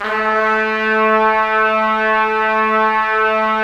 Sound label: Trumpets; Roland - LA Composer vol. 4